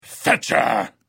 Hit

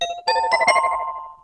Illusion Cast, magic; The Elder Scrolls III - Morrowind; Morrowind GOTY GOG

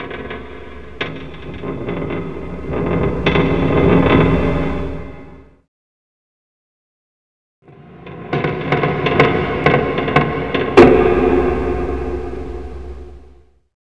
fact CREAK; The Elder Scrolls III - Morrowind

